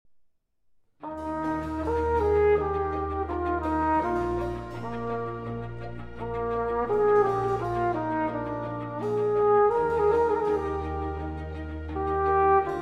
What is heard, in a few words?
Violin Concerto in F Minor; Vivaldi The Four Seasons; Op. 8 No. 4, RV 297 Winter II. Largo